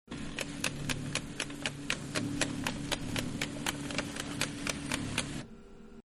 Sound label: Printer Page Advance, Computer Printer; Computers; Electronics; Manhattan Production Music